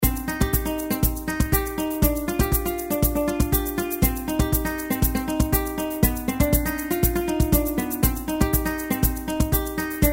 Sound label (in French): GUITARE PERCUS